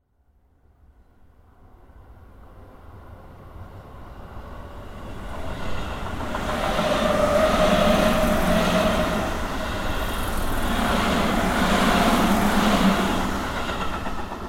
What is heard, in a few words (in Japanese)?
densya